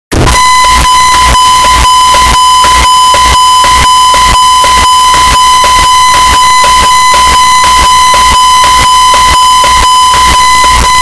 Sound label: very loud; fnaf 4